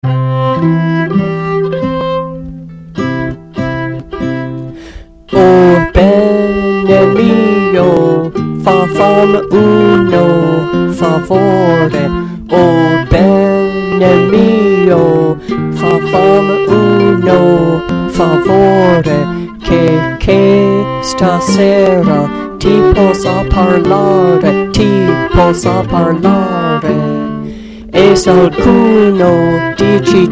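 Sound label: Alto Words; O Bene Mio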